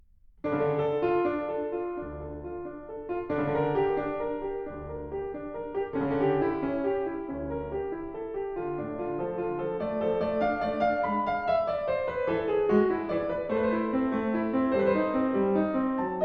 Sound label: Prelude In D Minor; BACH; COMPLETE SOLO CLAVIER WORKS; TOCCATAS PRELUDES FANTASIAS